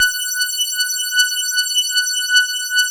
DS8 F#5, Korg; Back In Time Records; Korg Universe VOL-1

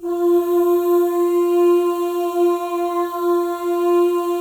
MOVING V1, Symphony of Voices; Spectrasonics

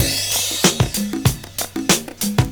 PERCS, 70 s Breakbeats

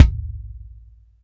BD, Bassdrums, Roland TD-20

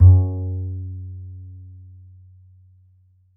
A, Upright Acoustic Bass; Larry Seyer; SWITCHABLE POSITIONS

